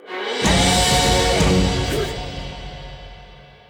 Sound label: Place Goal Fanfare; Tiki Turbo - 3rd Place Goal Fanfare; crash bandicoot series sound box